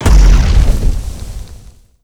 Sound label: sticky napalm impact; weapons; dota; batrider